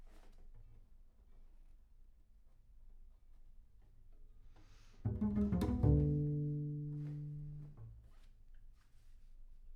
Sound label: Bass Room